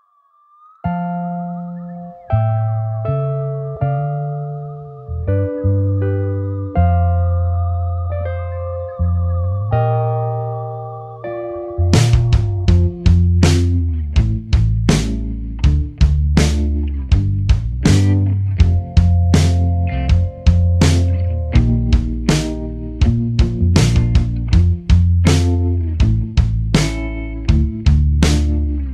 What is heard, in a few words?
Instrumental